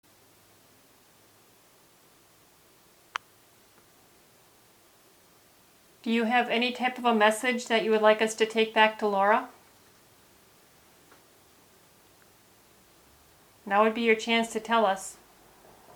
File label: K2 Hit, Electric Disturbance; EVP